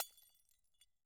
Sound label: break smash; angband